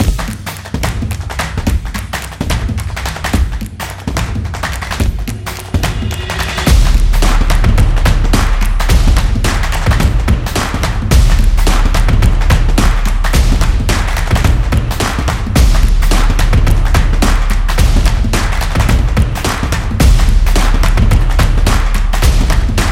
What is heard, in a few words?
Upbeat Clap